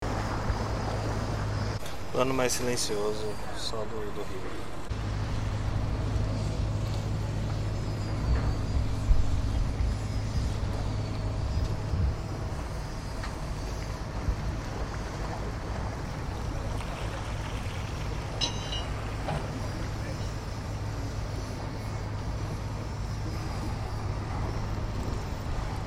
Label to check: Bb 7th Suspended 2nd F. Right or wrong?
Wrong